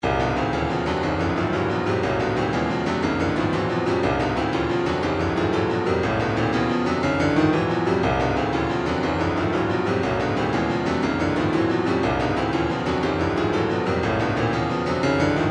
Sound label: retro funk 120; higher